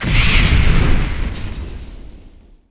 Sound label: heart hit; The Elder Scrolls III Morrowind GOTY; XBOX